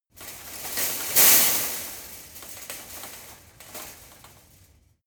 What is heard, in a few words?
land metal fence